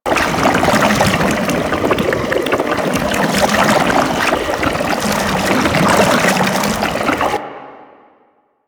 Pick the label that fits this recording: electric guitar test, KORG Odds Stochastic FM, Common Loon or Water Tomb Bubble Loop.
Water Tomb Bubble Loop